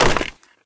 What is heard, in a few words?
item contract tracker drop